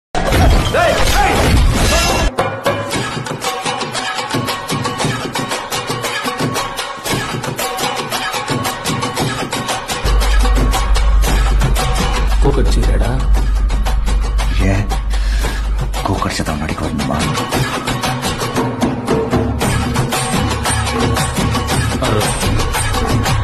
background score bgm fight scene; bhavani; dhanush; maari kalai version; maari 2

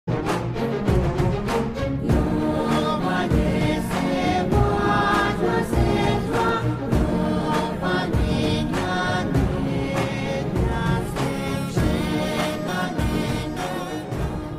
mobile ringtone; bellamkonda sita ram

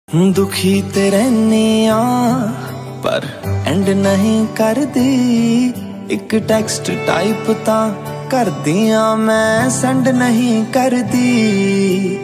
punjabi song ringtone

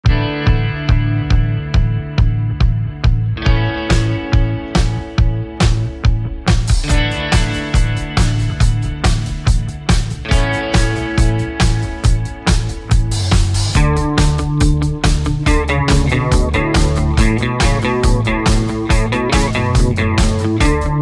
romantic ringtone

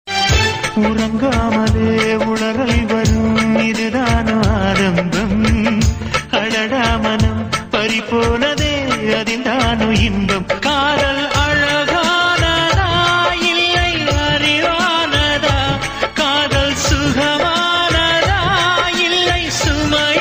love ringtone romantic; viral trending ringtone; vijay famous

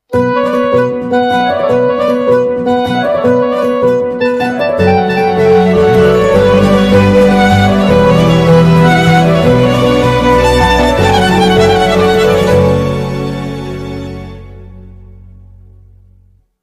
love bgm ringtone; ethirneechal; arjunedits

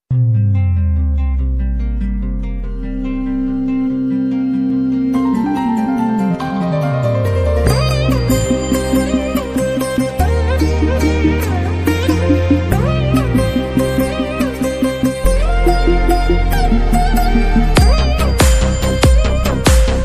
song bgm ringtone movie in telugu; varudu kavalenu

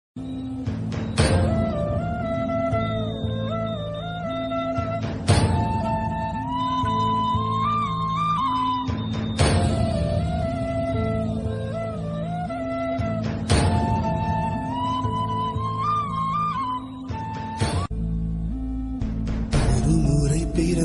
tamil love ringtones song ringtone

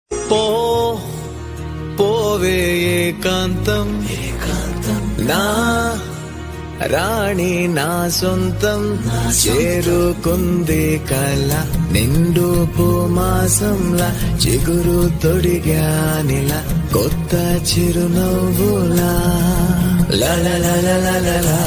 movie song telugu song; raghuvaran b tech

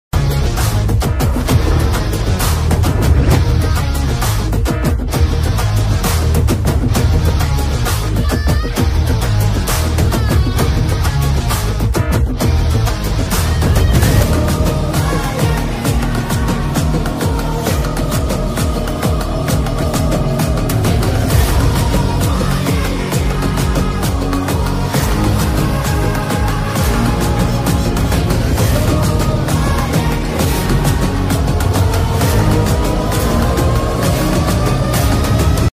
malayalam movie mass intro bgm ringtone; prithviraj sukumaran; gold

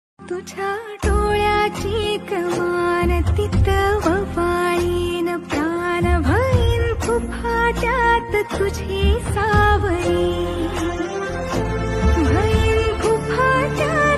marathi status song lofi love

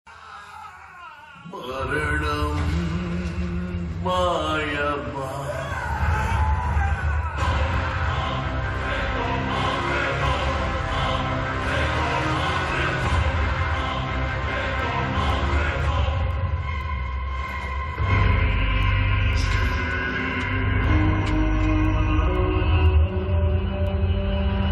villain bgm ringtone music; demonte colony ii; demonte king bgm

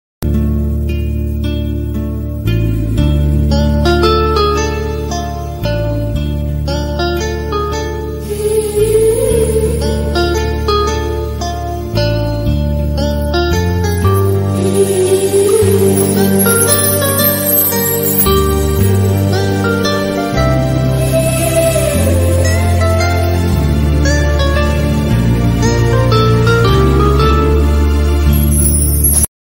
best guitar bgm ringtone; nagarjuna

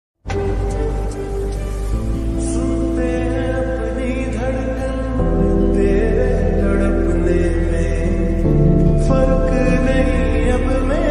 hindi song ringtone best love song ringtone

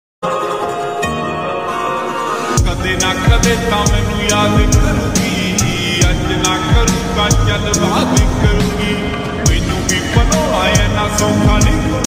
punjabi ringtone broken heart ringtone

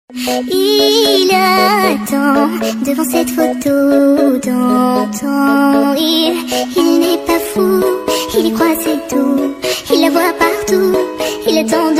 ringtone bgm

